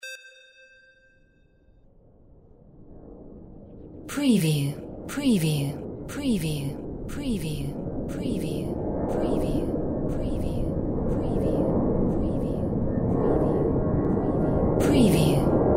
AIRPLANE BUILDINGS; windy beach audio

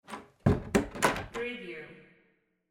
door close

